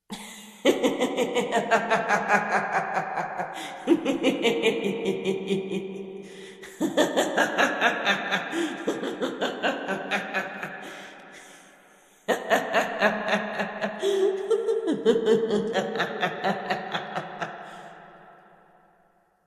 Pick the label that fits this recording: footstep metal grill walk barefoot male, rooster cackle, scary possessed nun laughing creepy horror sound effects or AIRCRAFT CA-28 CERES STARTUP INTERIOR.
scary possessed nun laughing creepy horror sound effects